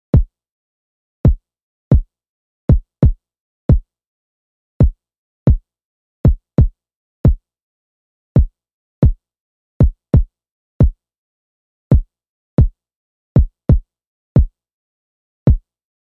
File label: kick